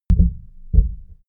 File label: heart beat flow; comedy-cartoon; koerpergeraeusche